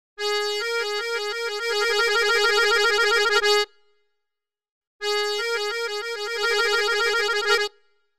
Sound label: trill sample